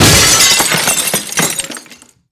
Window Shatter; Window Shatter 05; impacts

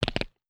Teeth Clacking; Vintage Cartoon; Foley

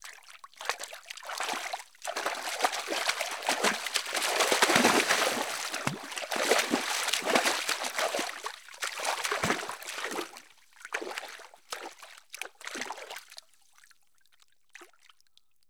Splashes Soft

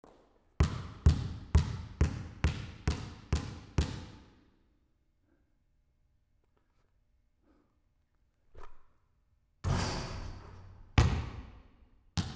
Basketball Free Throw

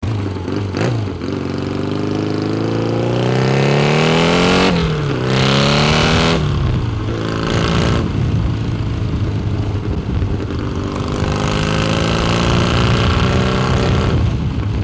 Motorcycle Leaving

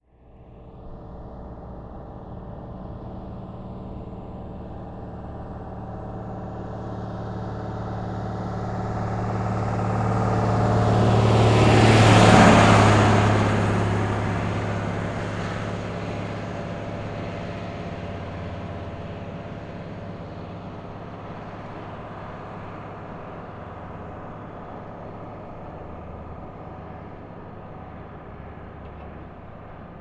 18 Wheeler By 30MPH; Vehicles

